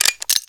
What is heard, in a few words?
hand grenades ammo; serioussam2